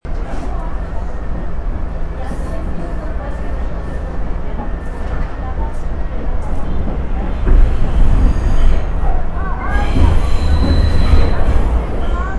sounds of the nyc subway on a sunny saturday afternoon